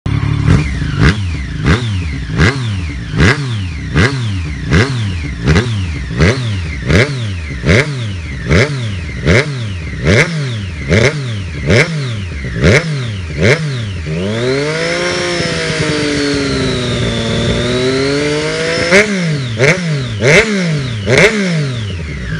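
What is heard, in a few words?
Street 765, Auspuffanlagen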